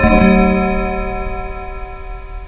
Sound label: Bell